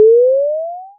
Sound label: Alien Space Sound